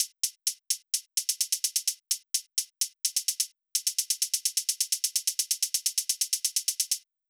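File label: Loop 128BPM hi-hat Add; VEC5 Drumloops; VEC5 Multi Loops 128BPM; Loop Elements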